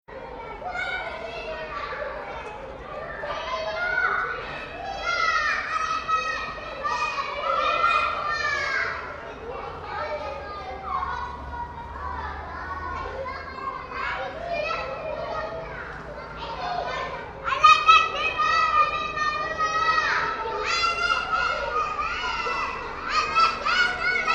Primary School Young Kids Playing Foreign Voices Screaming Shouting Walla; Playground